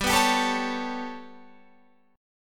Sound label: B 7th Suspended 2nd Sharp 5th, chord